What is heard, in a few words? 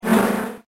swarm